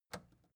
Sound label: record player power push off